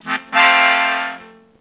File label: tada